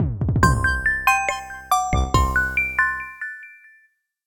ingame jingle decouverte salle; level 22

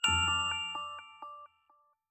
Nuit jingle; onisep

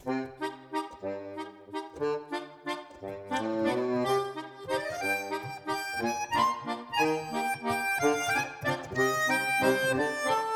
accordeon

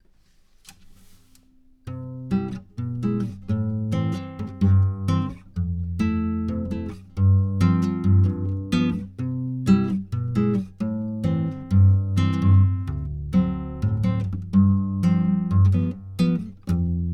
guitare

